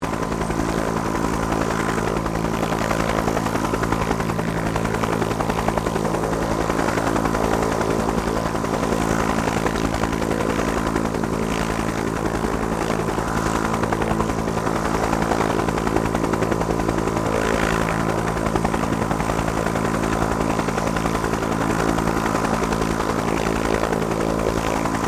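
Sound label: helicopter Bell UH1 Iroquois idle; RAN UH-1 Iroguios; Helicopters (Sound Effects:Vehicles)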